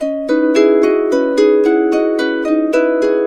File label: SWI, SWIRL, Complete Harps; SWIRL 110; USB Soundscan vol.62; AKAI